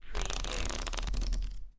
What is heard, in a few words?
Playing Cards Fan Flip In Hand; casino; poker cards dice; lynne-publishing